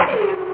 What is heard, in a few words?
cannon